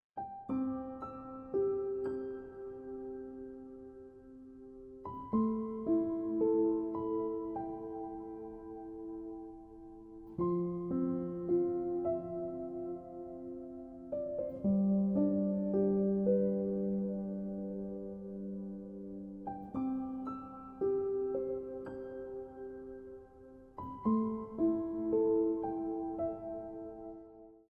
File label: Ringtone